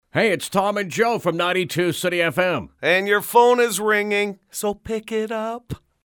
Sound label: RINGTONE